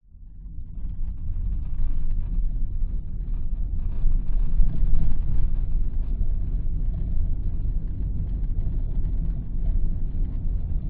vehicles car drive bumpy dirt track slightly wet internal windows closed binaural; zapsplat